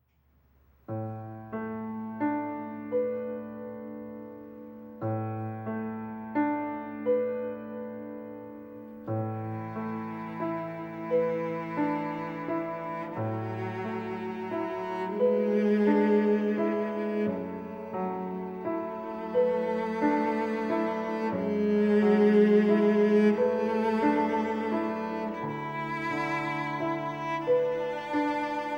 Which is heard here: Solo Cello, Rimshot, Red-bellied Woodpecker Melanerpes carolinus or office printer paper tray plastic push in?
Solo Cello